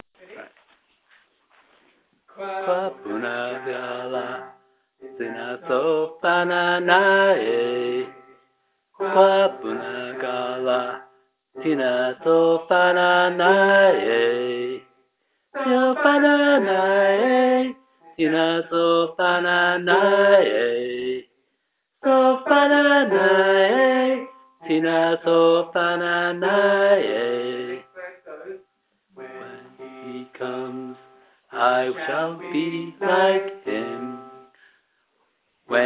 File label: Bass, gospel choir